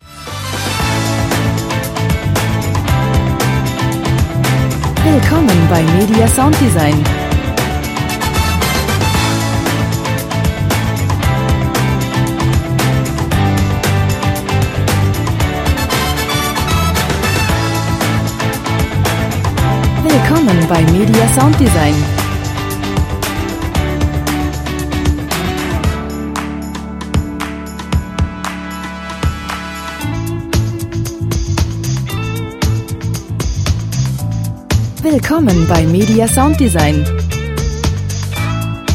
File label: groovy